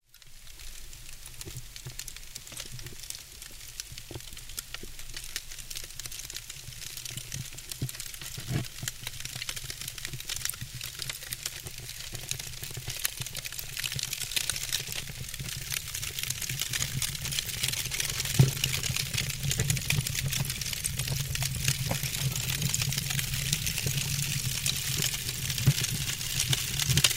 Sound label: piece of newspaper is on fire